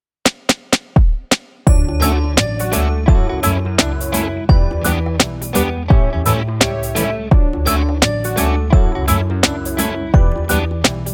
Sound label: Reggae 85